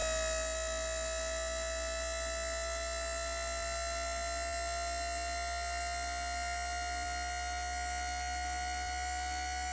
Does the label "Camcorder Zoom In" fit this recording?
Yes